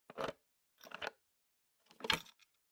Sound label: Time Recorder Key Cover Open